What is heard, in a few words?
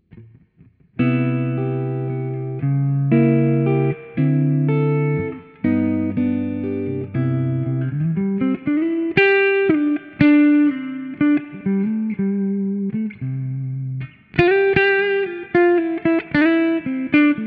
PAF neck